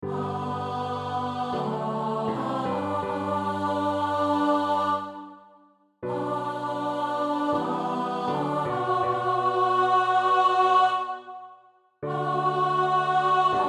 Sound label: Alto; Sanctus